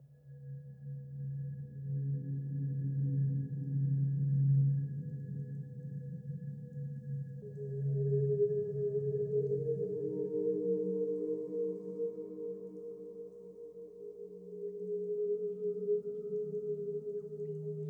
Breezing Rivers

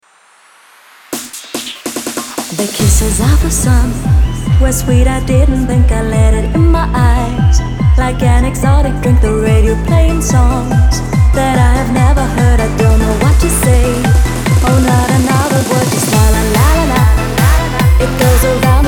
Technoelectronic Trance

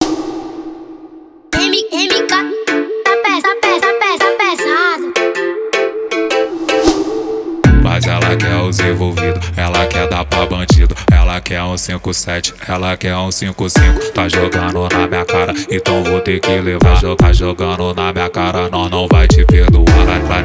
Brega Funk

